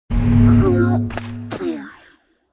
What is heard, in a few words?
moth death, monster